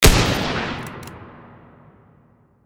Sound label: tools rifle